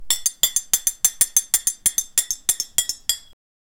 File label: bubbling soup spoon stirr